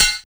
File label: CLANG PERC; TEK PERC1; Drums In Your FACE!